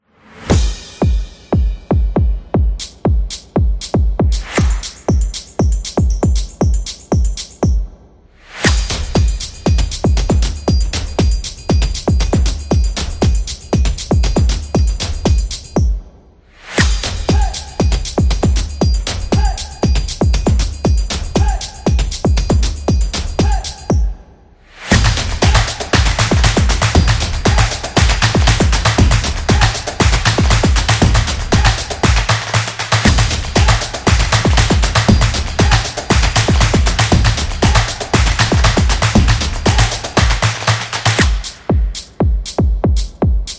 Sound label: Fun Beat; energetic drumming